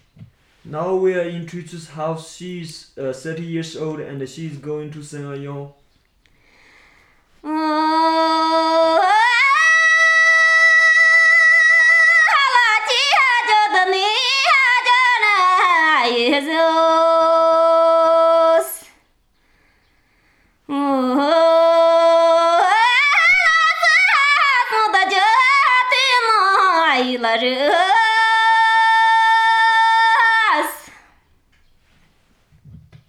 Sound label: Nyi shar Folk Song